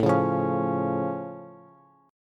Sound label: A Augmented A, Bass